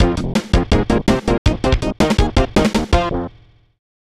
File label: Unused Jingle; Zool Ninja of the Nth Dimension; snes